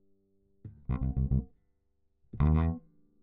Bass

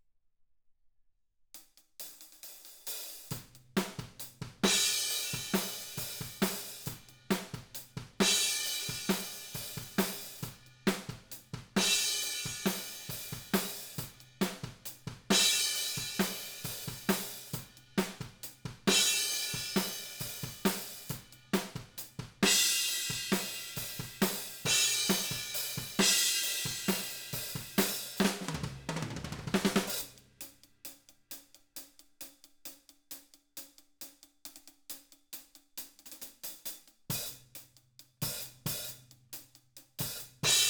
OHS

